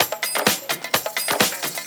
Latin Loop 128 BPM

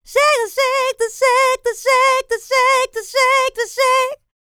licks, vocal, dance; ILIO; Vocal Planet

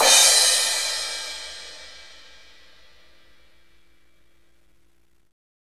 cymbal, Roland; Northstar; Drumscapes Roland; Cymbals 1

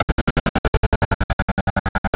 HELICO, Anime Sound FX; COMIC

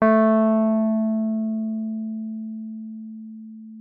WURLITZER, A3; Xsample VOL-1; 90 s Sample CDs; WURLITZER RS

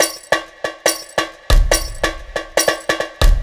Addon Loop, Drum Loops 140bpm, Perc Loops

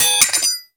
SWHIT, SWORDHIT; Total Control - Action Cutz

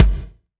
kick, Hardcore Breakbeat; Big Fish Audio; Hardcore Breakbeat Action

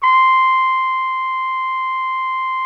BRASS 2 C5, ORCH BRASS; Greytsounds VOL-1

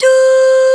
OOOHS, VOX; SC55; East West - Global Sounds

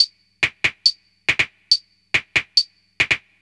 Percussion, Drums, Trance; Sonic Foundry (Sony Creative Software); Trance NRG VOL-1

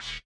BUZZER; Spectrasonics; Metamorphosis

